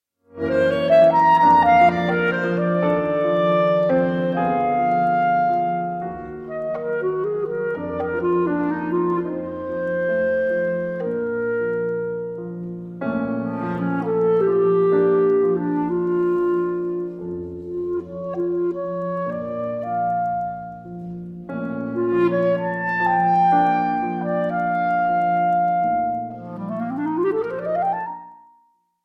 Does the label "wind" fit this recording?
No